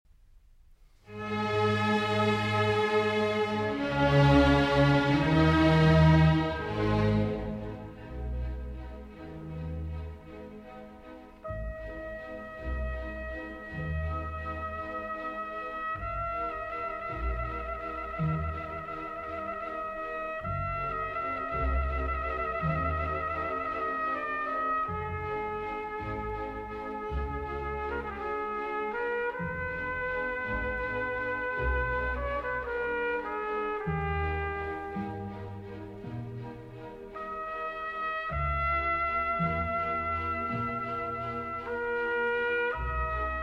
Trumpet Concerto in E Major, E-Flat Major Version, Andante; Hummel; WoO 1, S. 49